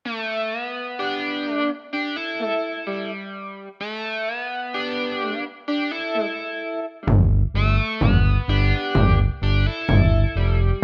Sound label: Americana